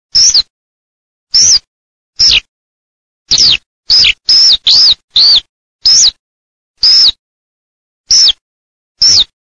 ALLODOLA

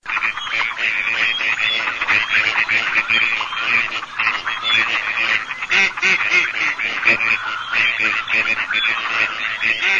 CANAPIGLIA

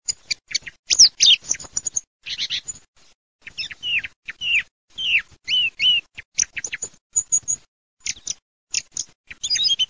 TORDO

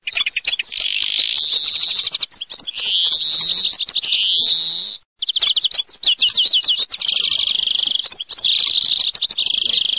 verdone cardellino

